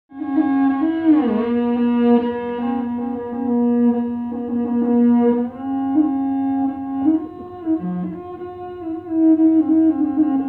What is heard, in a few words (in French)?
morin khuur ou viele a deux cordes